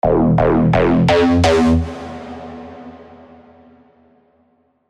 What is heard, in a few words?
Synth Fills E (128 BPM)